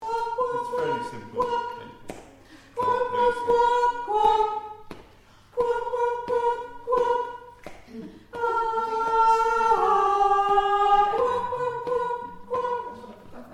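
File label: soprano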